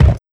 Kick, Kit; Sonic Foundry (Sony Creative Software); Esoterik Beatz; Annoya

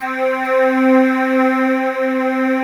DEEP BRTH, BREATHY; Synth

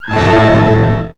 SYNCLV HIT, SYNCLAV HITS; Greytsounds VOL-1

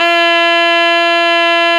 dudy F 3; SoundBytes - Early Patches

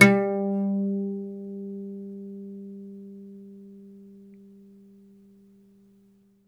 OUD G2, Plucked Strings; E-MU Producer Series Vol. 7 – Old World Instruments

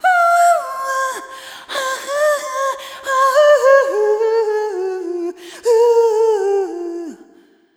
Arrhythmic F

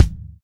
WD Kick, kicks; Roland BK-5

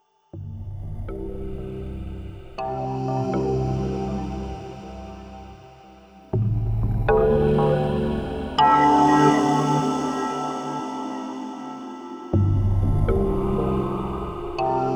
Rhythmic Bed; Beds; Sonic Foundry (Sony Creative Software) - Slow Silhouette

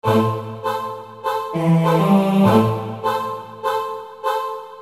Ahh Stabs; Construction Kit; HIP-HOP; Sonic Foundry (Sony Creative Software)

